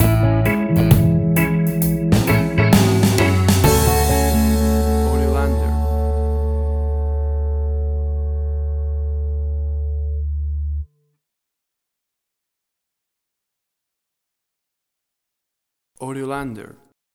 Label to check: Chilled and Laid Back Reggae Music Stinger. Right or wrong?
Right